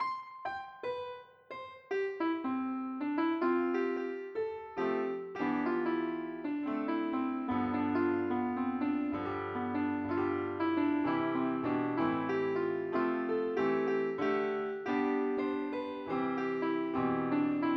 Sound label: piano